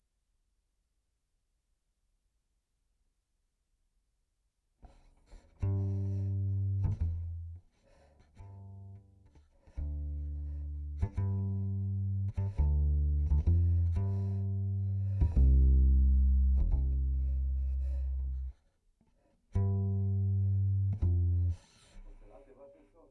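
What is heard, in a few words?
Contrabass DI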